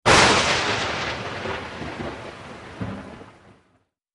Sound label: Lightning Alert Nature Sounds